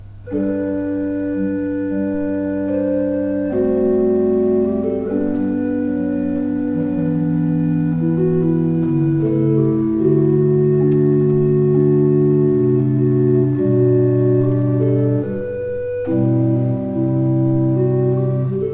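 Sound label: Positiv, organ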